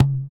THROAT; Spectrasonics; Metamorphosis; THROATERS

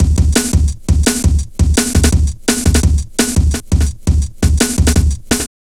Steppa, Jungle, Drum Loops 169.5; Zero-G Jungle Warfare 1, 2 & 3

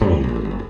DOWN D, Electric Basses; PlatinumPhattCD2; E-MU Producer Series Vol. 8 – Platinum Phatt

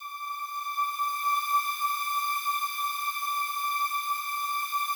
slow strings D5, Yamaha VP-1

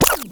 Scratch Up; Mo' Phatt; Digital Sound Factory Mo' Phatt

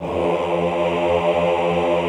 AHH F1, Extended Classical Choir; Best Service

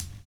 KICKING; Univers Sons - Kitch 70s

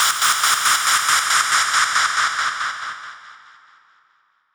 Fall, Hits & Falls; Zero-G Trance Inducer

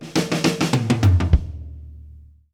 Fills 102; Zero-G; Monster Beats

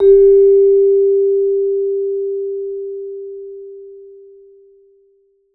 VMED G3, Vibes; Sampleheads - Dave Samuels Marimba & Vibes

